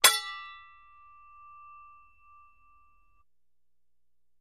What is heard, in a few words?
Axe Clashes With Ring Outs; Light Axe Clink With Scrape And Light Ring Out. - Metal Scrape